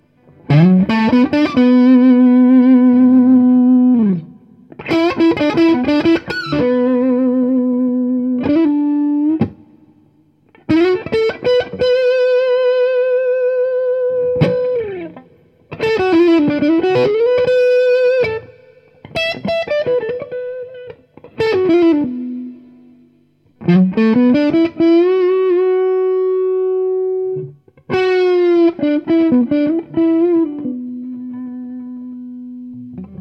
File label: humb rif blues, guitare; HUMBUCKERS GNB